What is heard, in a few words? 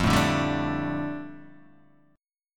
F 6th Add 9th F down Guitar DADGAD, chord